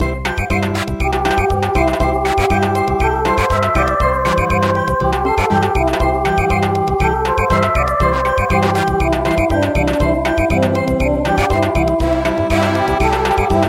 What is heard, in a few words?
Samba; Candle Dance